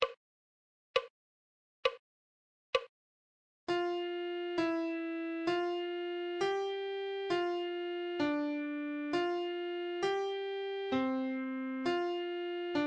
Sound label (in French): Clé de Sol, Adagio